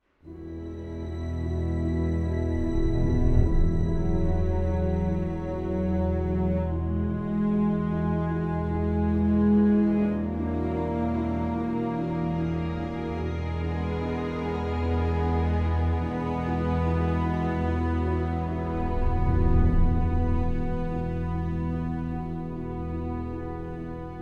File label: World Music; Jurassic World Evolution 2; PS4; Windows; Xbox One; Xbox Series X/S